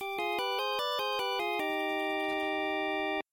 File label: Bonus Race Start; Magical Hat no Buttobi Turbo! Daibouken; Genesis